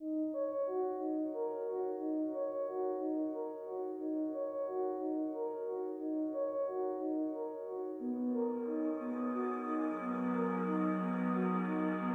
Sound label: Ambient Nothingness; NWNDS; Ambient music pack